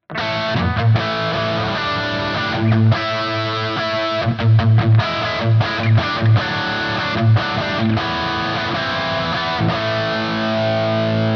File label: MUSIC MAN L3 crunch 1st position